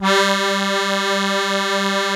ZUPAN CHRO, AKKORDEON

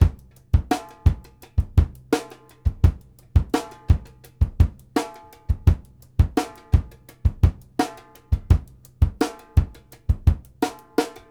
FUNKY KICK, 84; Sampleheads; New York City Drumworks VOL-1; SP BLASTX 84

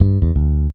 BEL; USB Soundscan vol.31; Miro s Grooves; AKAI

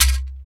CHEKER, ETHNIC PERC; Roland LA

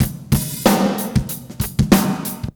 Toyo loop 93bpm; Sonic Foundry (Sony Creative Software); Hip-Hop Street Beats Construction Kit; Street Beats by Poogie Bell

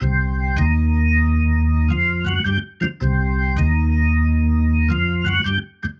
Perp Organ, 80bpm, C; Loops n Lines

